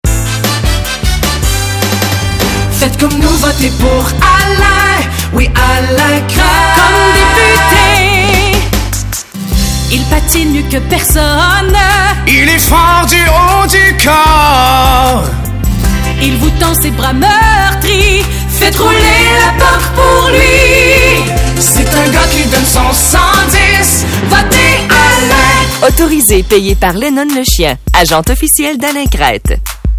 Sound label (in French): jingle pub